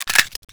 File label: l96a1 clip lock; weapons; swb